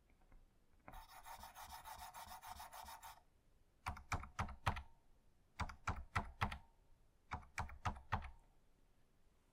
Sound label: Media; K79 Gain